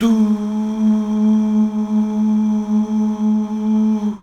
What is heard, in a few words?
DUUH